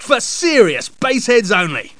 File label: Bass Heads